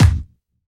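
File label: snare drum bass drum, Dance Mega Drums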